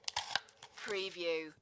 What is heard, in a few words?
hole puncher